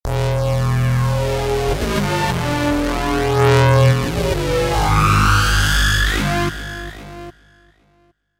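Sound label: Feedback OSC, Roland V-Synth; Factory Patches; 023 FbackOSC TT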